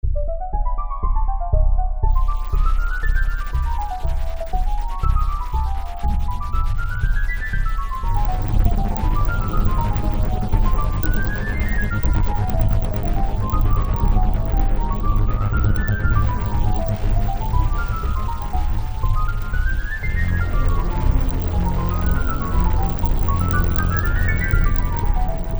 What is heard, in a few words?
moog